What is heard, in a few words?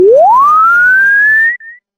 fluid water swoosh